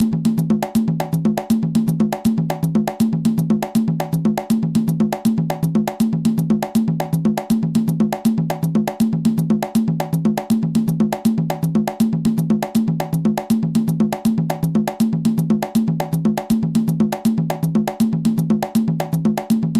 columbia matanzas tumba

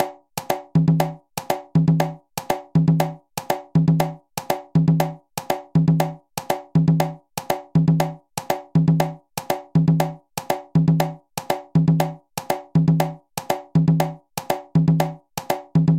pachanga conga

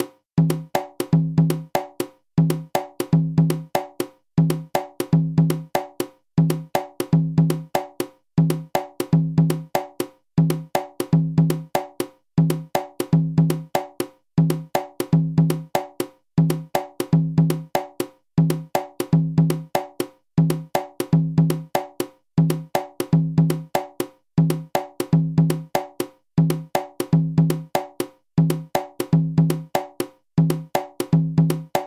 makuta caja

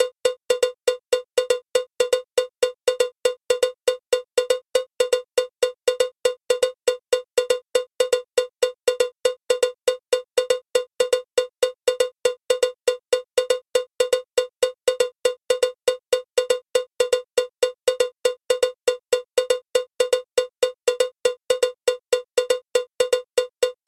palo guataca

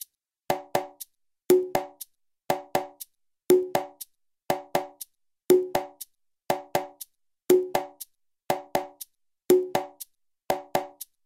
yambu matanzas repicador

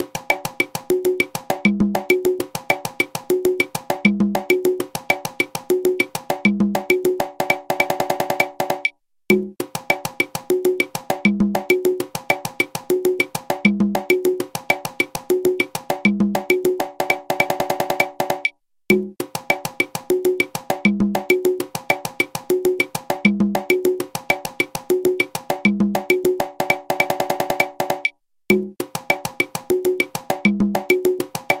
timba cierre

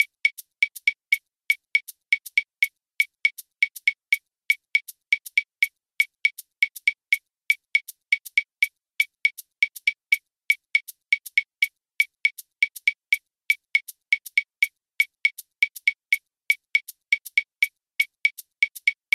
columbia matanzas clave

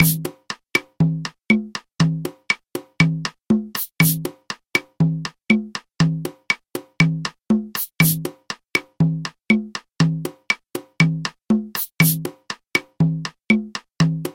yambu habana

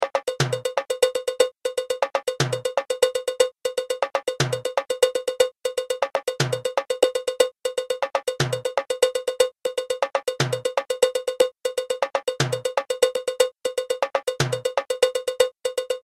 songo timbales

